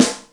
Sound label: Looz Pic, Yamaha DTXpress Acoustic Snare